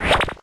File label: Corkscrew Crash